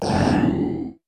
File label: DRUMMM FREAK, DRUMS + PERC